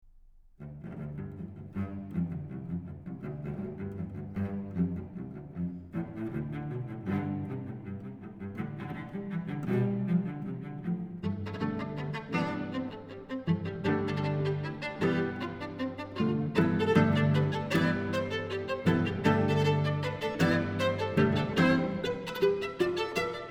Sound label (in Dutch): Sonate Voor Viool En Cello Vif, Avec Entrain